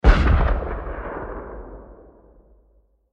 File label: Explosion Military Blast Trail Off; Warfare; Explosions